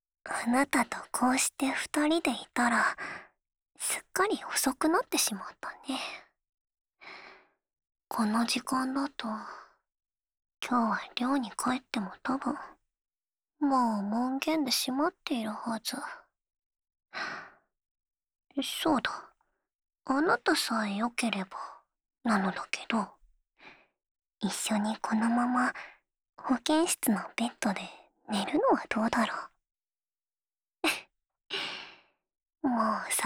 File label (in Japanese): 安眠ボイス＆寝息（右）, ASMR